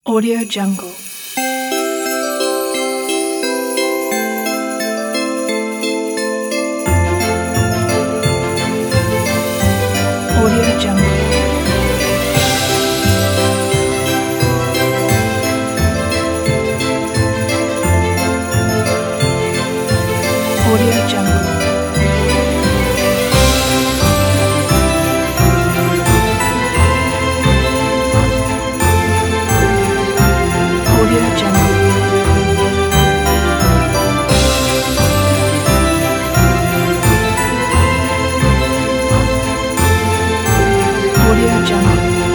christmas music pack; mangoaudio

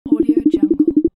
points

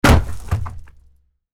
wood big logs fall; matter mayhem; soundmorph

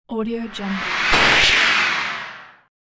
sliding drive mechanism

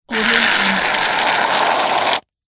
shaving foam

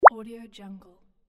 app button

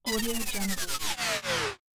scifi digital shutdown sound

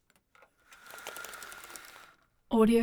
venetian blinds

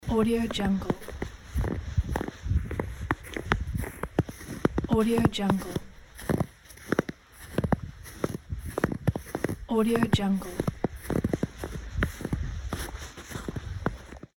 walking on snow

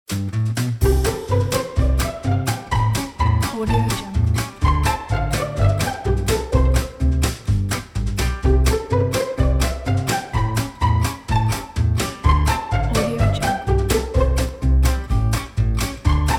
happy upbeat retro ad

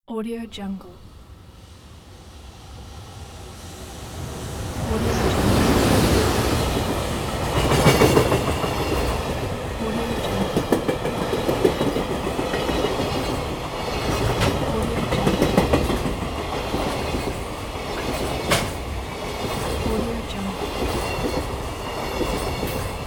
freight train

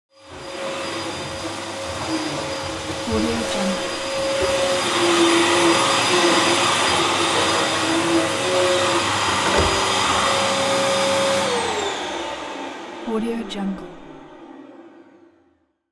object household vacuum roomy moving turn off

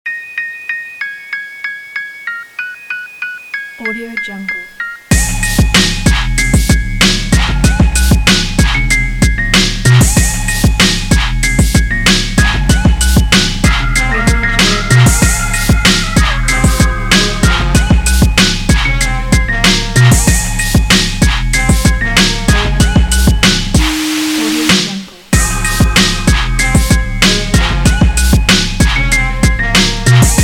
hip hop; brooklyn

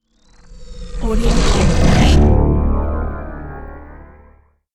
transform whoosh; gkrieger

